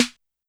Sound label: Snare Analog, YAMAHA RM1X